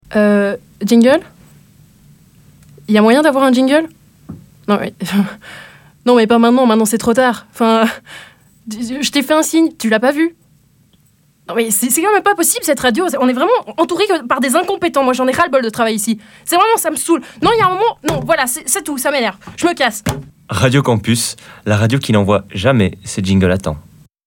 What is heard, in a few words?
sans jingle session